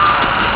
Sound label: car horn